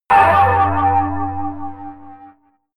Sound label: shimmering metal; hits explosionen; metall